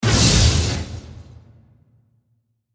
Hit Processed Impact; Blastwave

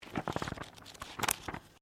Book Page Flick; Book movement; Books (Sound Effects:Offices)

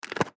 Helmet Visor, Go Kart Helmet Visor Lower Clicks; Go-Carts; Vehicles; Sports; Blastwave

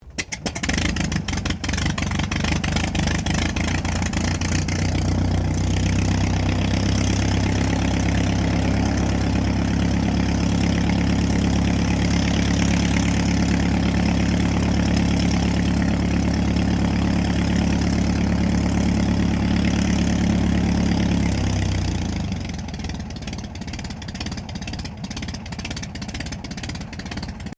AIRCRAFT DH82A DEHAVILAND TIGERMOTH STARTUP IDLE; RAAF Tigermoth; Airplanes (Sound Effects:Vehicles)

